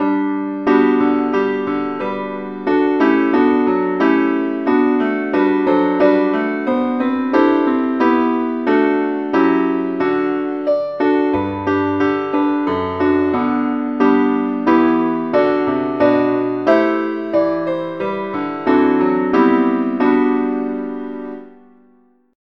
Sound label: keyboard only key g